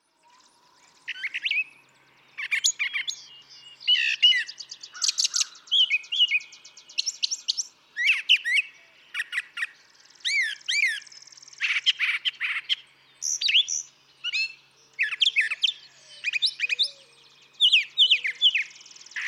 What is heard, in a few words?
Brown Thrasher